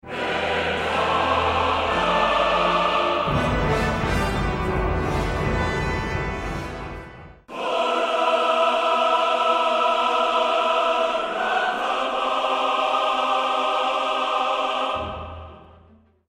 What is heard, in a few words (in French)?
choeurs